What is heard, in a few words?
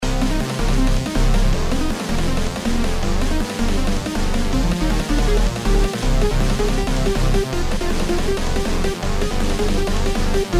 Aggressive Dragon; イントロ無ループ用